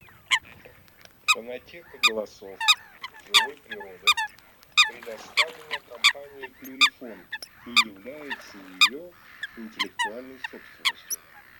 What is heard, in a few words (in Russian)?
Лысуха Folaga; plurifon